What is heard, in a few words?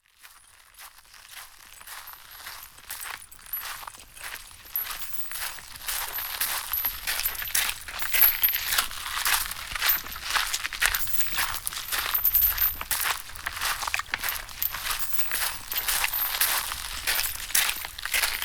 Footsteps R L - Am 60Bpm; Construction Kits; STEMS; Ghosthack; SkyRealm; Kit 28 - Long Walk Home